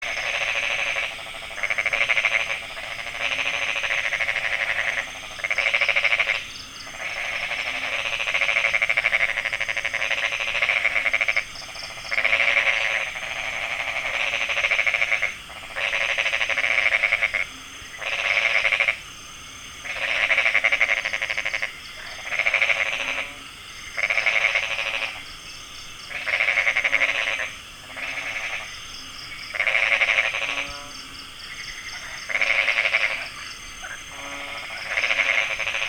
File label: Fejervarya cancrivora